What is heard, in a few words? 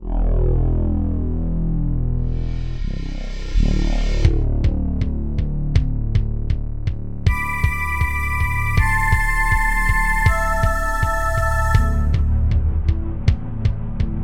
fluidsynth